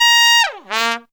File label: TRUMPET FX; Zero-G; Phantom Horns